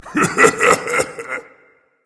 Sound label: laugh berserk; cuchulainn